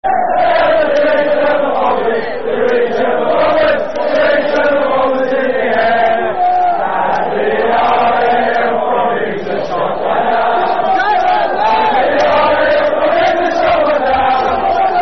10 German Bombers In The Air. [Football Chant (Soccer Song)], England